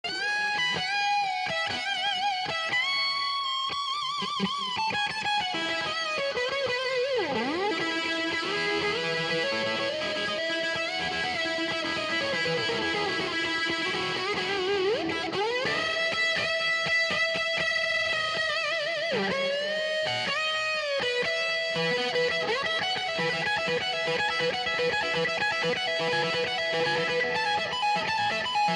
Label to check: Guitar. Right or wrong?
Right